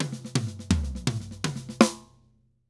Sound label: Drums Poly 120, Drums & Percussion